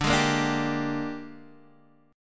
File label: A sharp 13th